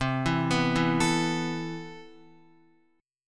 C Major 13th, Guitar, chord; arpeggiated; CM13; x,3,4,4,4,5

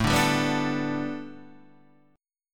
A 9th Suspended 4th Guitar Open D, chord

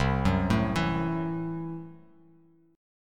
C 6th Flat 5th C down

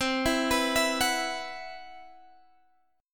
C Major 7th Flat 5th, chord

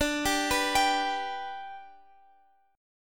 D Suspended 6th D, guitar

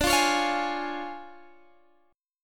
D Minor Major 7th Flat 5th, D

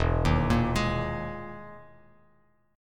E-Major Sharp 11th E, Bass Standard; EM#11 arpeggiated; 0,6,8,13; JGuitar

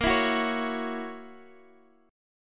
Gb 7th Bb, chord

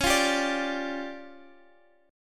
D Minor Major 7th Double Flat 5th C sharp, guitar

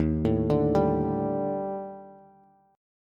Eb Major 7th Flat 5th, Bass; EbM7b5; arpeggiated; JGuitar

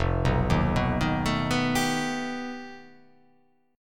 F 9th Flat 5th F down

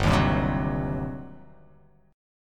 G Major 7th Suspended 4th Sharp 5th, G, down

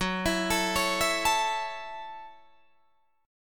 F sharp Minor 7th F sharp, Guitar Open D

